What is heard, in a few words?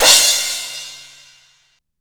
CYBER KIT, DRUMS